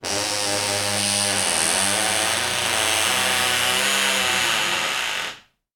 household door squeak creak loud; sound effects one

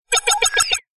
science fiction robot small beep process chirp

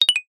multimedia ui notification alert short glassy

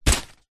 foley luggage camera bag grab pick up; zapsplat

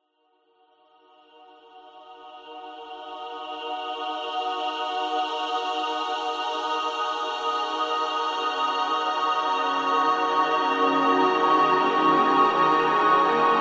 musical heavely euphoric swell; zapsplat

